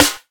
clean steel snare drum sample g key, snare sound tuned to the G note; snare drum samples